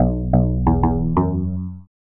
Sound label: bass